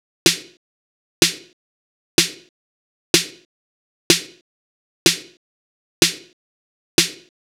Pepper Drum Loop - 125 BPM Snare; Cymatics - ANALOG EVOLUTION Beta

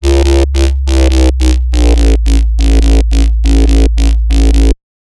Bassline, Dubstep and Trap

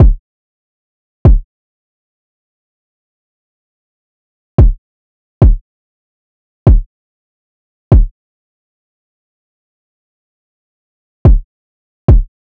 Drum Loop 144 BPM Kick, Trap; Cymatics; ANALOG EVOLUTION Beta

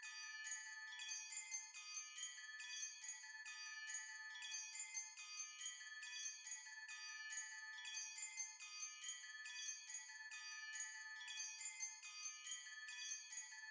140 BPM A Min Bells; trap; Melodies; Loop Stems; Cymatics; Trap Starter Pack; Cymatics Gems Vol 1; Castles

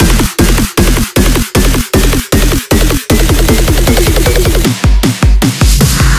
fill loop 155 4 bars; GhostHack

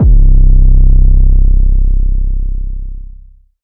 808 C; Advent Calendar - Day 9 - 808s

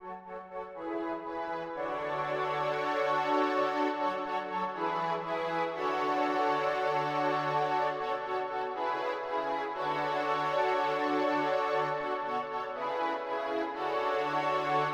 120 BPM F# Min Synth; Melody Loops; Escalade; Retro Collection; Cymatics; ANALOG EVOLUTION Beta

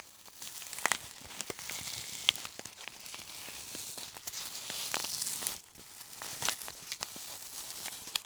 crackles; Cartoon - film effects

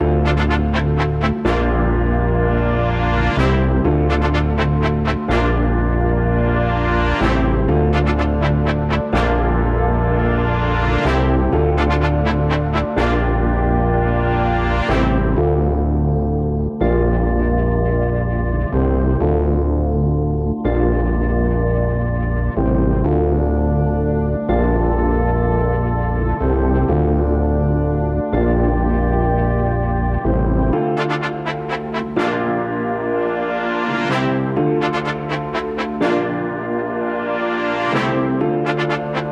125 BPM D Min, Brass; Foreigns; Cymatics; Destiny Premium Melodies (BETA); Destiny - BETA PACK

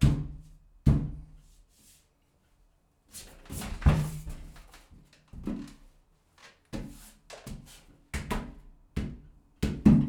Body bumps hits empty Bath; Fairlight Sound Lib

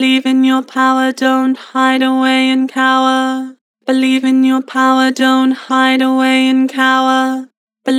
Believe in your power, Vocals; Harmor

